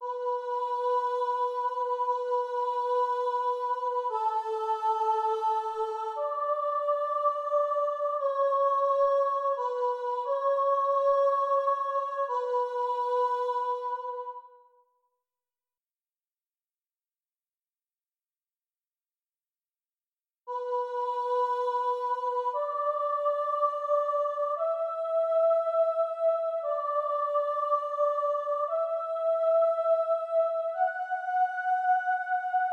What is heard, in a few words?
soprano alone at tempo, choir; bach j s nun komm der heiden heiland bwv 621